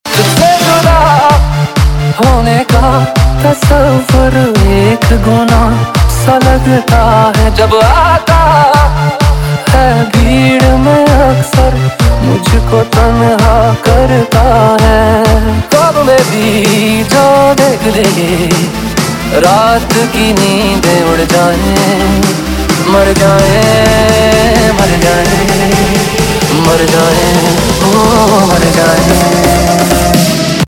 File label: EDM Remix